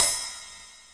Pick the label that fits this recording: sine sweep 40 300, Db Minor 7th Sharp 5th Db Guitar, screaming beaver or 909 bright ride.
909 bright ride